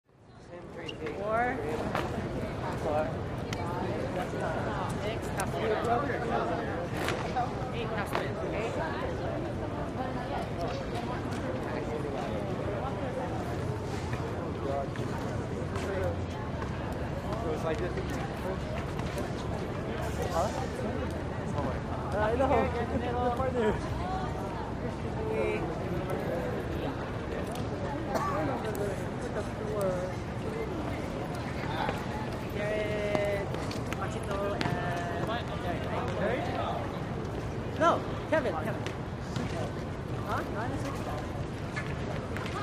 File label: School Walla High Sc, crowds ext